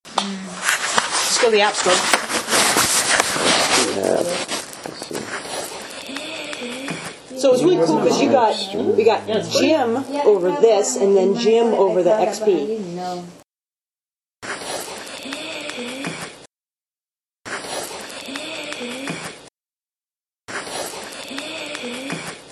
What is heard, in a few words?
gasp